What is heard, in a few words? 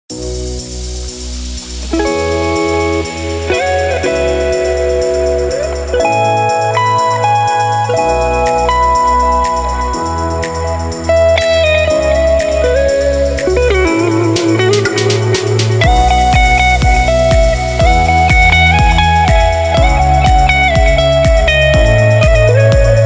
chillout